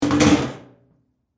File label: Trash Can Lid Close, Household, Trash, Can, Lid, Close, Metal, Garbage, Can; BlastWave